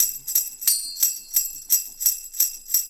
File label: Roots Reggae; Sounds Good - Roots Reggae